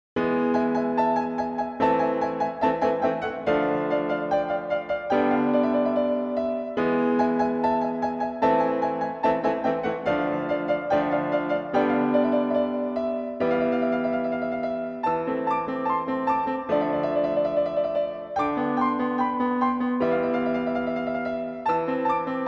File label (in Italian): minuetto